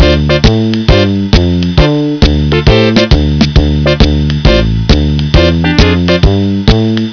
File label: GROOVE; MUSICAL